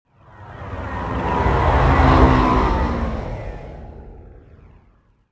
traffic by

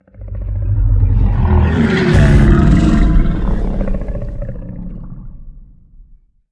sea beast; Star Wars Knights Of The Old Republic I; XBOX

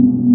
Sentry Turn Loop, Sentry Droid; Aliens

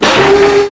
crane starts; mechanic; Max Payne

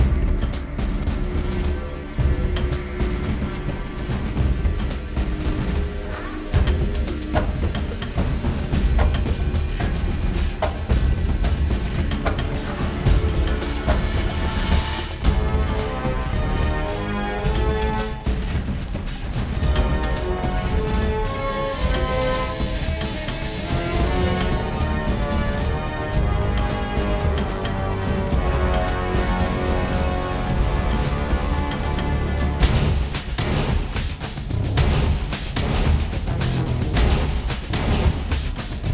fight; Godzilla Save The Earth; XBOX

